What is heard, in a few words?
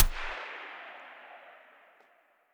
vss loop close tail; Escape from Tarkov